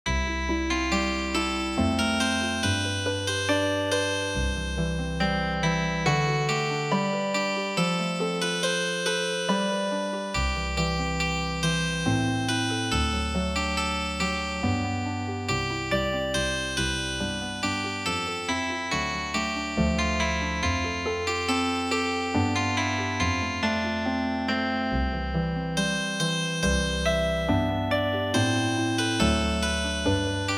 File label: Healing Harp; midi; traditional